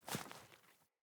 Grunt Boot Walk Veg, Footsteps, Boots; Enemy; Sinecure; Melee Grunt

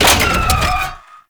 Thumper Bot Leg Damaged; Warframe; Grineer

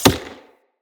Throwing Knives Impact Wood; Weapon Impacts; Environment

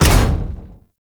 Jump Landing

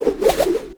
Grapple Update Layer Cast; Valkyr Rework; Warframe; Berserker

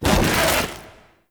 Broken Frame Dodge; Warframe

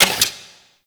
Fist Movement; Enemies; Warframe; Tyl Regor; Fists

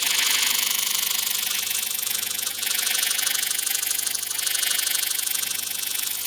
Electrical Generator Loop; Ambience; Warframe; Orokin

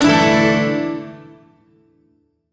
Shawzin Pent Maj Chord; Warframe; Duviri

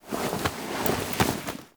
Foley Ship Move; Narmer Outpost End; Drifter

